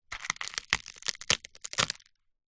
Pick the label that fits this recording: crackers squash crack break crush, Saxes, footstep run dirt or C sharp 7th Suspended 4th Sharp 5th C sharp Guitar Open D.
crackers squash crack break crush